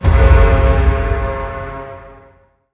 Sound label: Quest Completed; willy nilly knight macos windows gamerip 2017